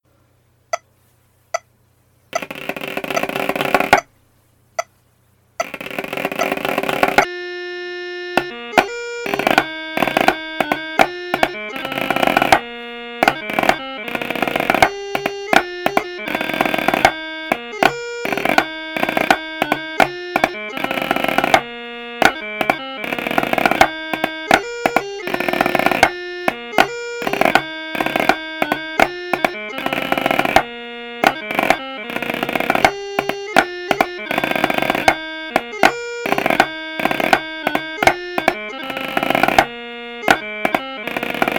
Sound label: The Lilt Snare Chanter